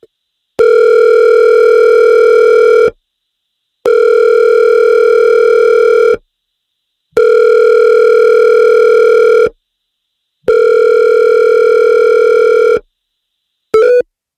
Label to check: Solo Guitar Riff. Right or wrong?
Wrong